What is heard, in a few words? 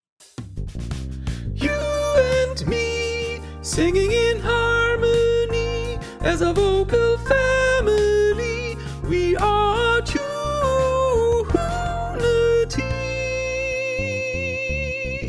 sopran pitched